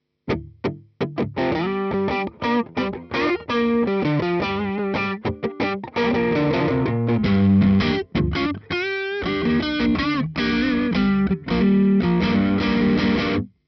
Lead; rock sound; true rock tone

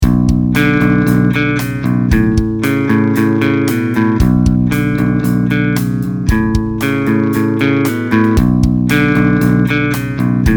Clean Rock Guitars DRY

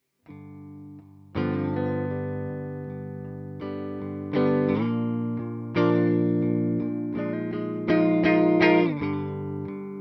CLEAN; true rock tone

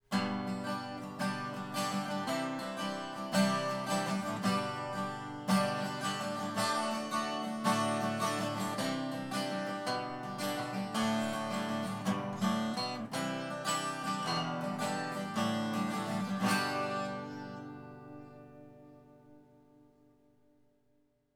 Spaced Cardioid Guitar; Four couples of microphones have been placed in front of some instruments

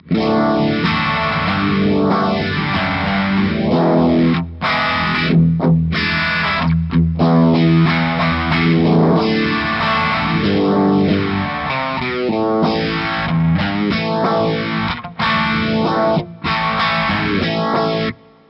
overdrive; DComp is a digital re-creation of a classic guitar compressor pedal

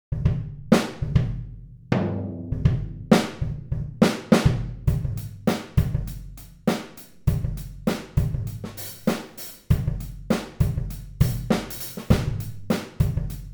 Roomy and relaxed, drum-sets; Natural Drumsets

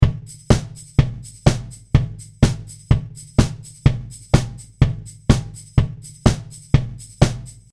Disco Beat DRY

